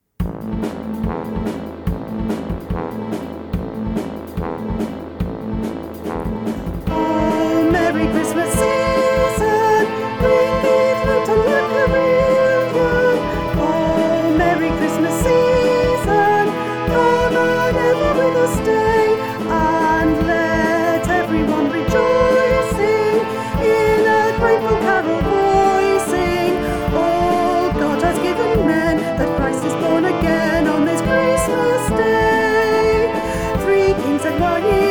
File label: Philippine Vocal; mayors christmas carol music